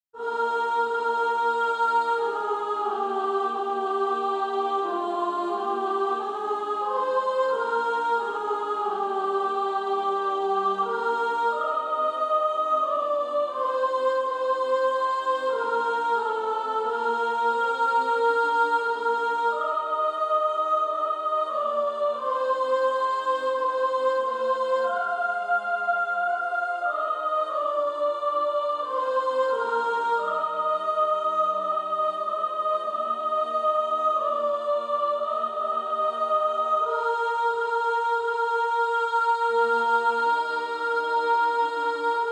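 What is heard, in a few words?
Female Choir, soprano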